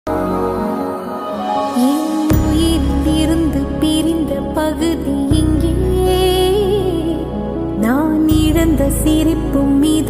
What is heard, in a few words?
amma song, tamil; varisu